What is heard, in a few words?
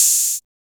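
Hat Open Tss; Drum Hits; Nu Dance Electro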